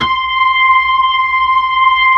B-3 C3, Keys of the 60s and 70s; Roland LCDP10 Keys of the 60s and 70s 2; Blues

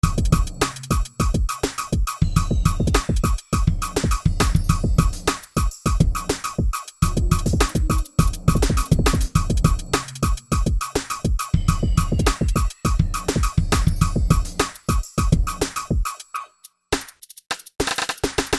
Beat; Sonic Foundry (Sony Creative Software); Infinite Soul VOL-1; Beats

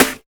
jacky snare; Drums In Your FACE!; NEWJACK

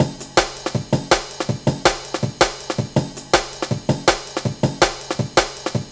162, breaks, Loops; M8

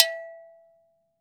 afro ago, afric ago go; AKAI S-Series CD-ROM Sound Library VOL-5

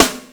Misc Snare

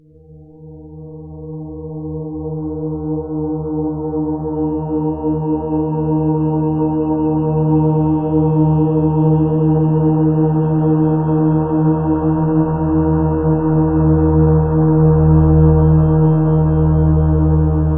TIBET VO, VOX STYLE, Ominous Drones; USB Soundscan vol.48; AKAI

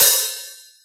Boss DR-202 hat

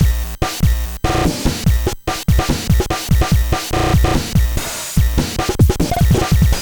NES Drum IDM Loop, 8-Bit; Nintendo Entertainment System; Drums; Chiptune; Sonic Foundry (Sony Creative Software)

